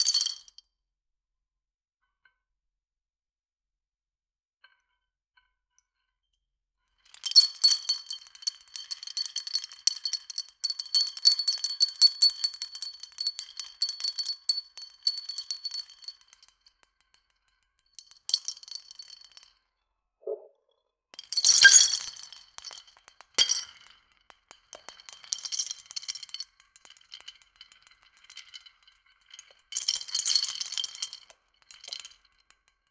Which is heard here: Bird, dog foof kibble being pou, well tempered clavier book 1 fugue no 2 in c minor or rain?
dog foof kibble being pou